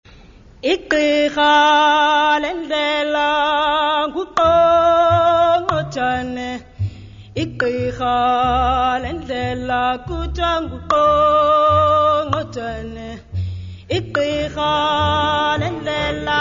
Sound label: Click Song